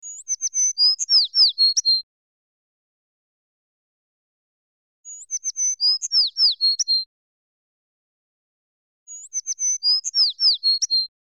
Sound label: Bird Sparrow